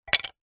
foley wood strip small thin drop or set down hard surface; zapsplat